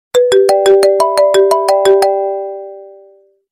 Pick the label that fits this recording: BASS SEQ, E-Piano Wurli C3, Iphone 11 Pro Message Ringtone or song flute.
Iphone 11 Pro Message Ringtone